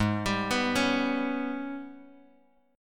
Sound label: Ab Minor Major 11th down, AbmM11 chord, Bass Standard; arpeggiated; JGuitar